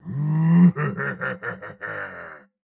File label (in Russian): Смех; Турбосвин